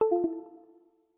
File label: windows 10 hardware remove disconnect